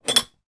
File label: cups mugs x2 pick up by handles from table